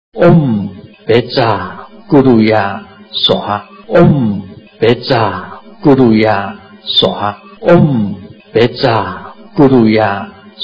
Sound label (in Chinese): 白度母心咒 White Tara Mantra